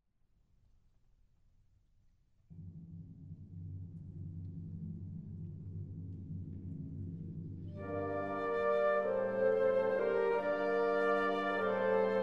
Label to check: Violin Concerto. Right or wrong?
Right